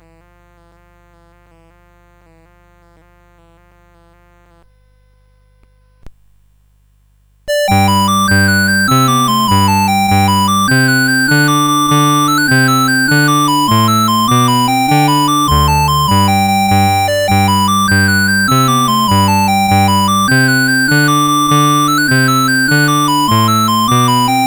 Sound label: Paddy Whack, CSG8580